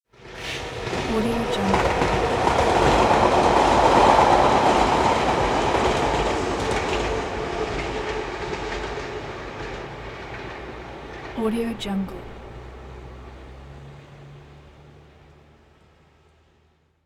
old school train

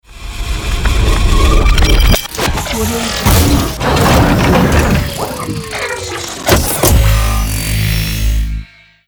transformation

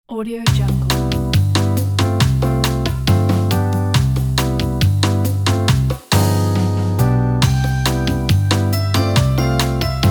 bossa nova

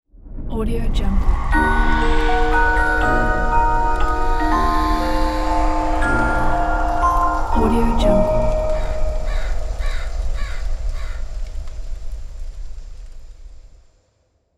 halloween logo

